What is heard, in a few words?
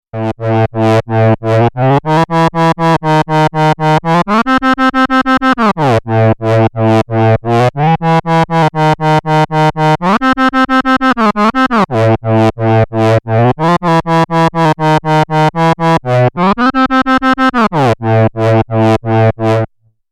Korg volca modular Dubstep Bass